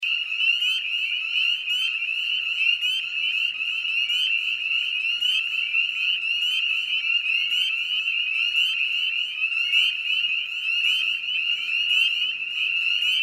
Spring Peepers, frogs in chorus

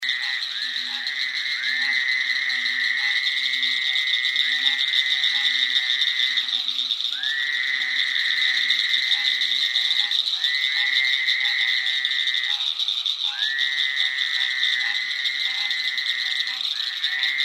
Kentucky Pond, toads frogs

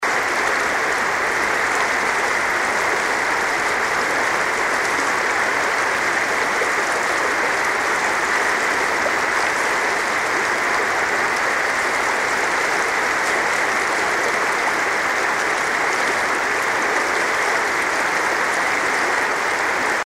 River Flow; creeks and waterfalls

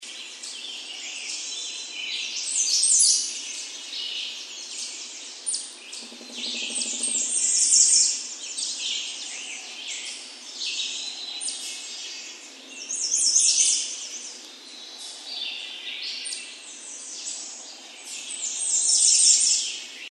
Forest Canopy; allegheny plateau

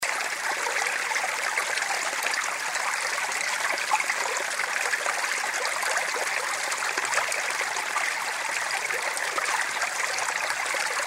Stream

